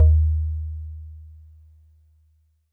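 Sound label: Warble Celeste DX100 D#0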